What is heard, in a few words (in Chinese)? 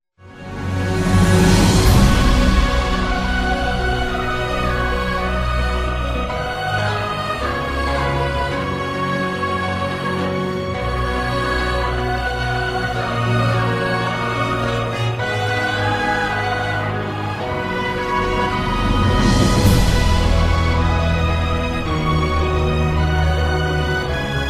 广告片音乐; 广告片背景音乐; 1分以内